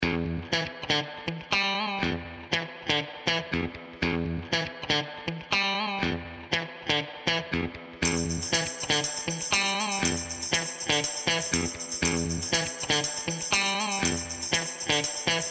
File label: Instrumental; PM USA